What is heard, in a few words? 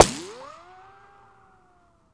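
ricochet, weapons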